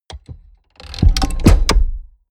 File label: mechanics large lock; industrie-wirtschaft; mechanik